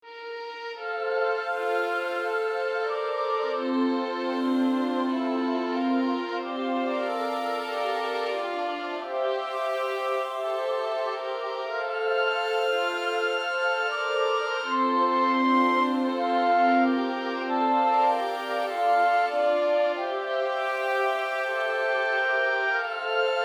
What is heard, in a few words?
cinematic